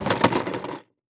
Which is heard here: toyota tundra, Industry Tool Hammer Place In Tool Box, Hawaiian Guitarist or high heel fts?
Industry Tool Hammer Place In Tool Box